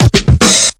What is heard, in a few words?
four hits, Kick Snare; Drum loops